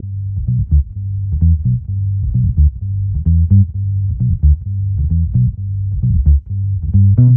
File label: Gmaj Bass Neighbors; Zero-G; Future Wave